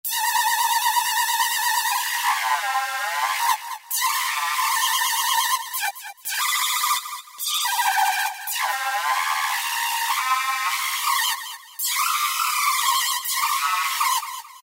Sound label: Korg microKORG Cry; Factory Voices